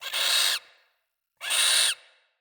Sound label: Day Close Critters, Ambience